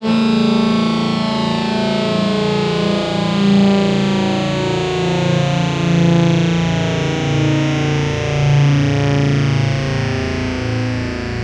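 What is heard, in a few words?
synth, Production Effects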